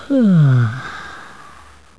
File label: YAWN